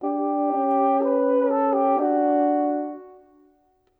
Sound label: Horns, Orchestral; Sonic Foundry (Sony Creative Software); Orchestral VOL-2; Modern 01